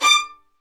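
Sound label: Violins Marcato; Roland LCDP13 String Sections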